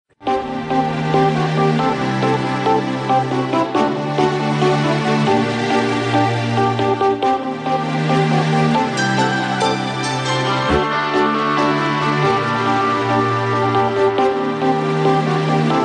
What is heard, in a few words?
newsroom ringtone, samsung